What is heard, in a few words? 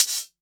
Open Hihat; Vengeance Samples; VEE Cymbals